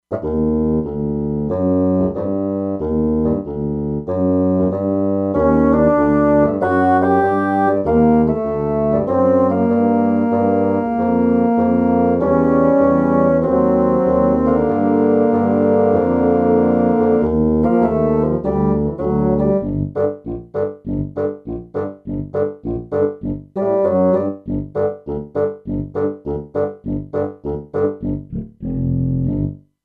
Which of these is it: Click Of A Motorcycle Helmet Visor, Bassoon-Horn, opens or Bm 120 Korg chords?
Bassoon-Horn